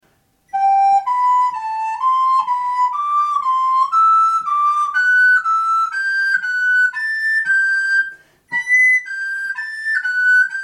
gamme G tierces, flute

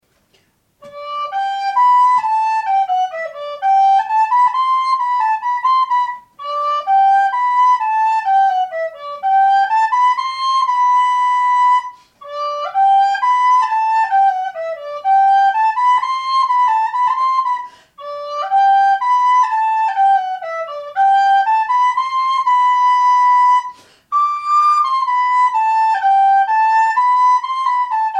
menuet, FLUTE

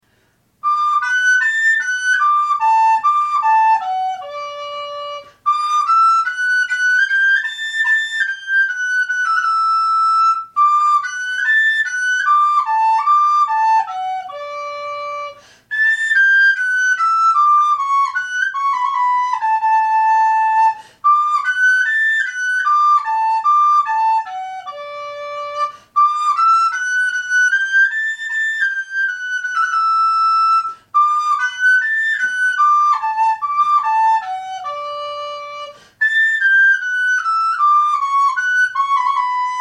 menuet, FLUTE

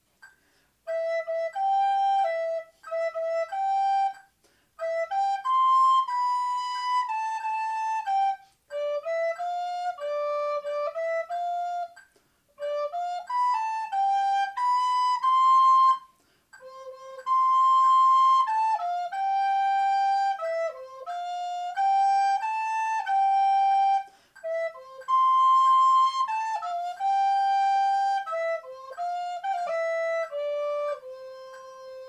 berceuse, flute

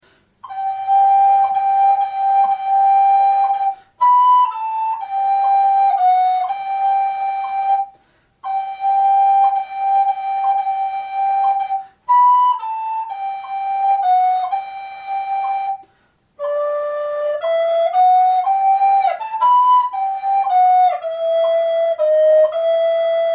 Pavane G Em, FLUTE

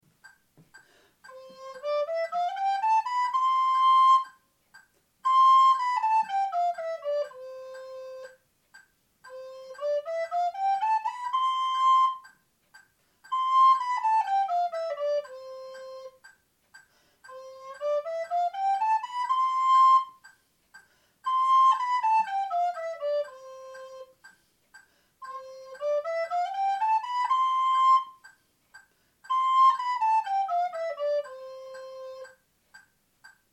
gamme, FLUTE

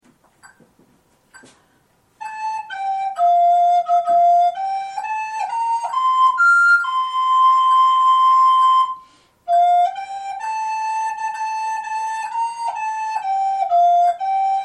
Rossignol, FLUTE

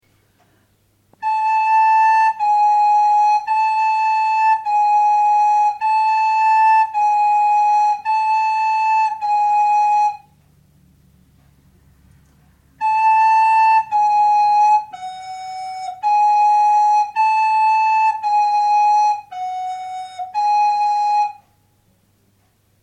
ex sol diese, FLUTE

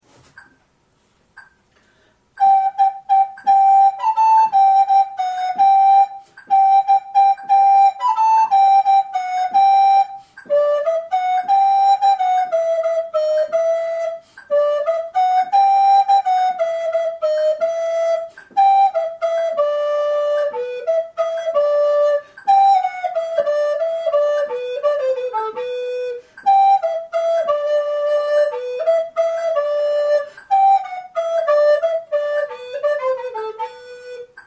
Gaillarde G Em, FLUTE

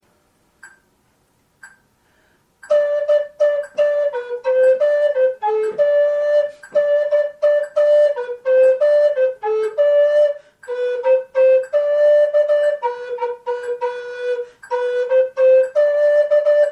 Gaillarde G Em, FLUTE

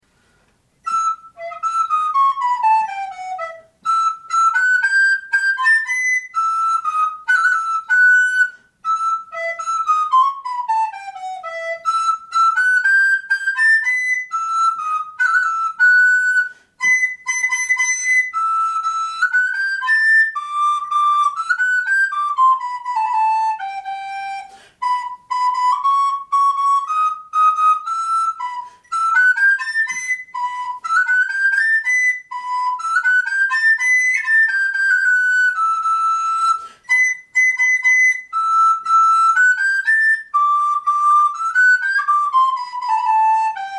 Branle village, FLUTE

